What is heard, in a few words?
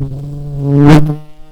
Lapidarius queens, bumblebees